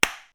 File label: hammer hit stone; mine